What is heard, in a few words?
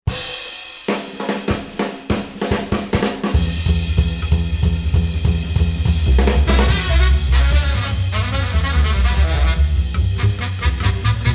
Paris Action, jazz; TV Comedy